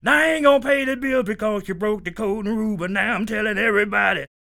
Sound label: SING, Blues Soul; Spectrasonics Vocal Planet